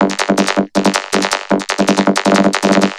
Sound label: Vocobeat; Sonic Foundry (Sony Creative Software)